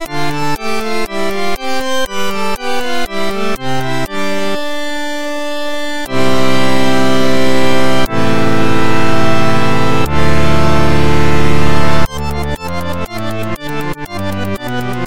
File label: Accordion; Harmodion; Syntheway